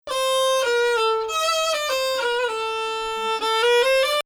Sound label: Bayati Samai Husseini Rast